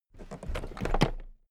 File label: toolbox raise heavy